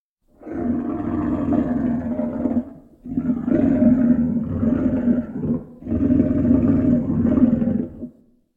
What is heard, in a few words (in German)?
löwengebrüll aus nächster nähe; Urwald